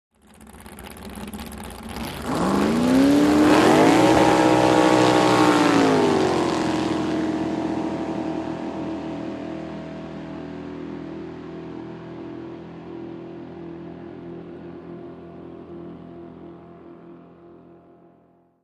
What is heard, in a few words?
Drag Boats By Race